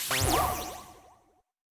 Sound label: Miniboss Teleport